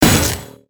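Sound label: Attack Spark Effect; Dragon In A Box; destructibles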